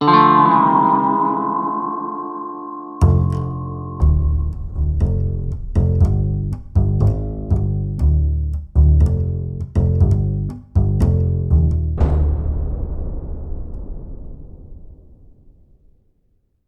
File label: Quest Stinger; Buried